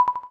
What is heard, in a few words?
Xylophone